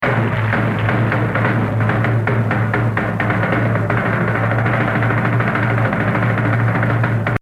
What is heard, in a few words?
Solo, jazz drumming